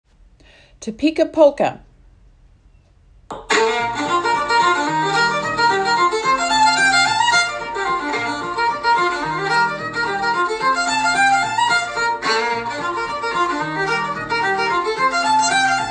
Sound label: Topeka Polka